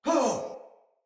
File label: Surprised Soldier Male; Battle; Barks; Dialog; Sinecure Soldier Male B